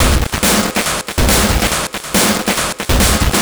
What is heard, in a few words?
Noise Beat; Beats; Drums & Drones